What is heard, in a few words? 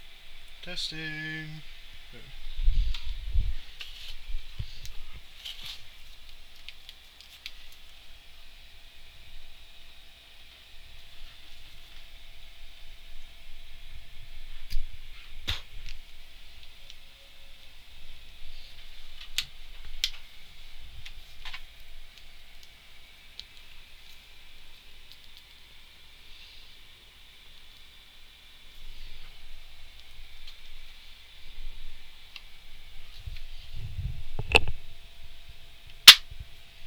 Priming, Rifle Lock; forlorn